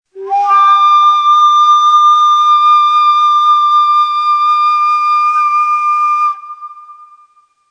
Tiz Chargah, Ney

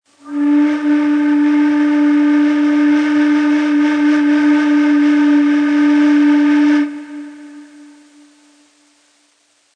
Kaba Chargah, Ney

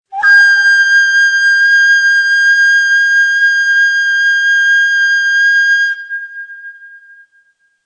Tiz Acem, Neysample

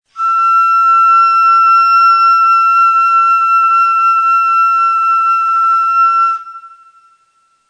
Tiz Neva, Ney

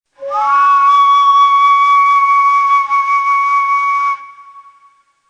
Tiz Segah, Neysample

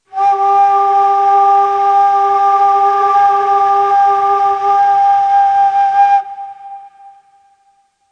Acem, Ney

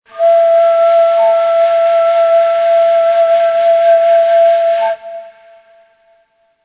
Neva, Ney

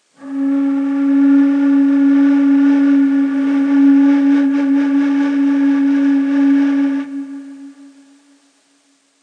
Kaba Segah, Ney

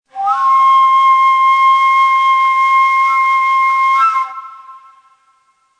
Sunbule, Ney

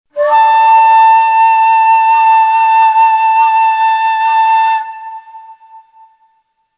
Gerdaniye, Ney

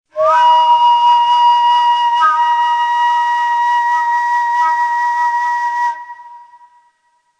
Shehnaz, Ney

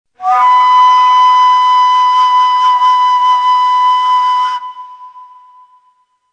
Muhayyer, Ney

